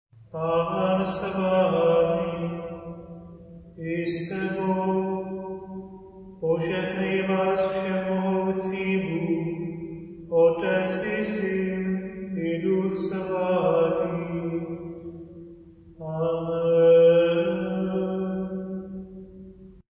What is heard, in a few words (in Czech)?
pozehnani choral; mesni rad